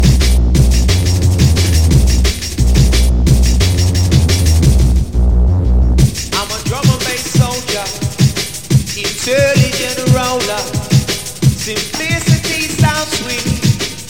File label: persian drum